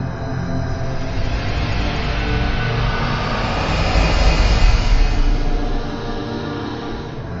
epic sweeping atmosphere loop; LOOPING AMBIENCE

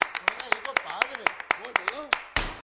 syncop claps, 120 bpm

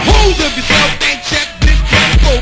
holdup, drum loops and breaks, 99 bpm; 091 to 100 bpm

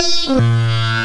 sitar three notes; World Music

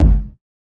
floppy bounce kick

